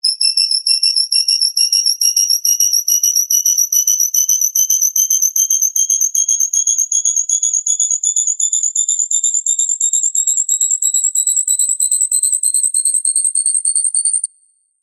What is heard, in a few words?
squeaky metal Designed UI; PMSFX